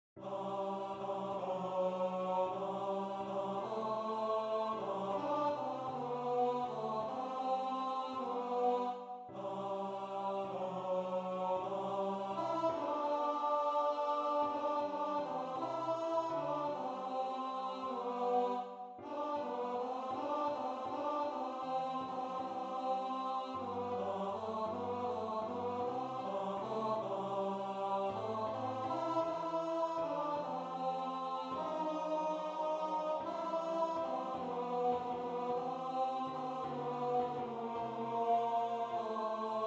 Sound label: tenor; Sweet and Low